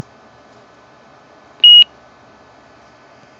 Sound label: success beep